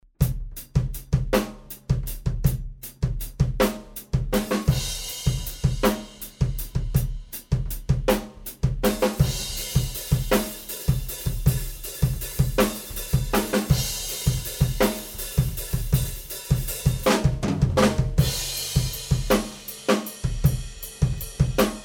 Room Mics C212

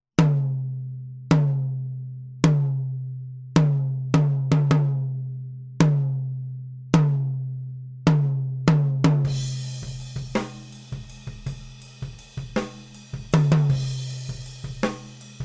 Tom Microphone Gemini II

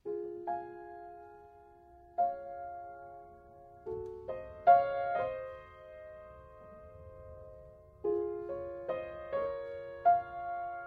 Piano 421, mic shootout

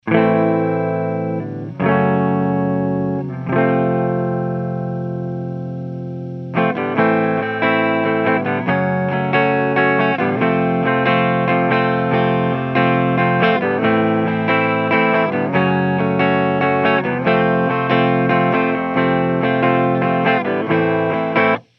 AMP Clean D2, clean eguitar; Audix D2; Dynamic

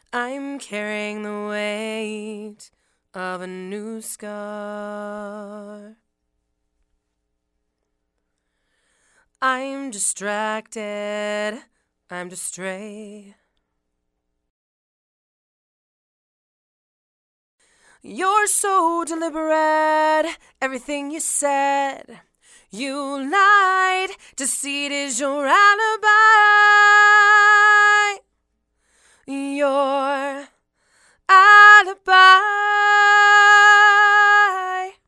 Female Vocals

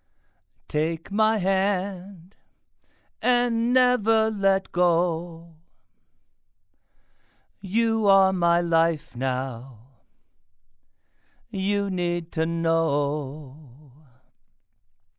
Male Vocals Clarion

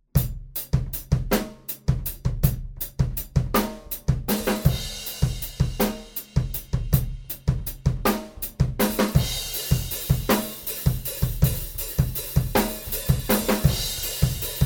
Room Mics 2200 T

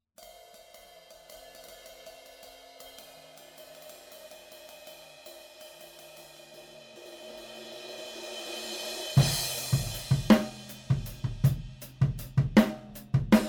Drum Overheads V2

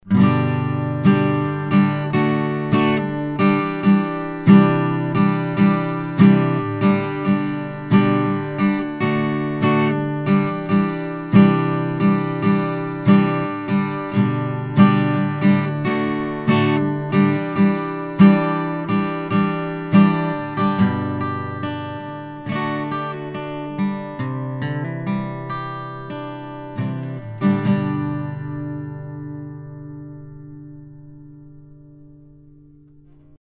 Acoustic Guitar 4050; Audio-Technica AT4050; FET Condenser

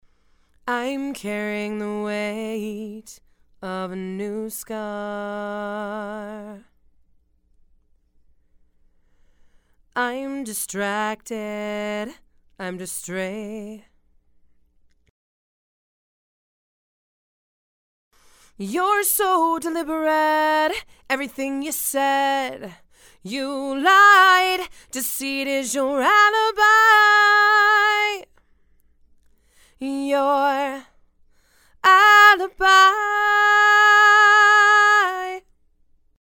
Female Vocals; Oceanus

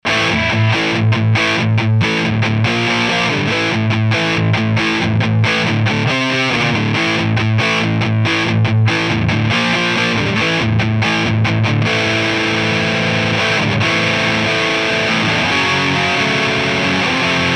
AMP DIst; SCX1

